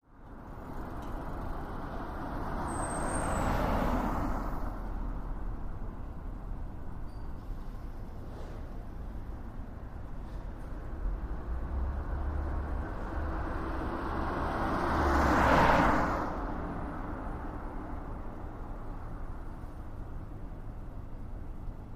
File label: town